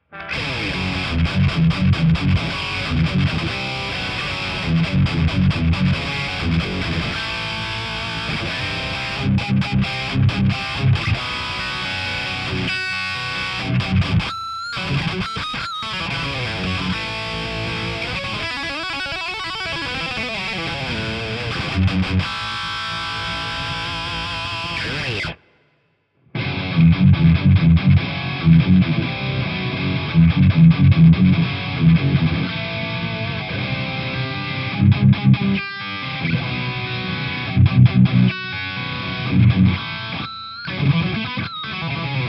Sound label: kemper recto impuls